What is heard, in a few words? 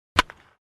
bat Sound